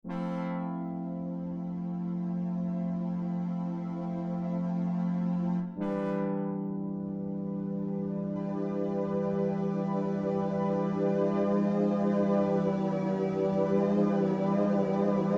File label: crumar performer